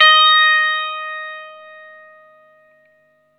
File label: GUITAR NOTE EF+, Vintage Blues Guitar; USB Soundscan vol.22